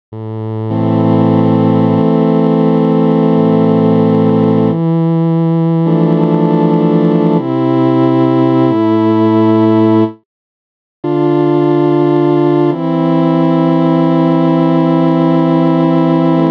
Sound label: Am90 Novachord Chords